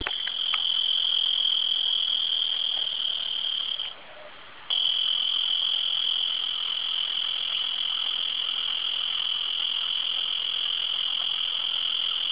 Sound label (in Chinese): 紅脈熊蟬, 蟬的叫聲; 生物多樣性; 生物多樣性教學設計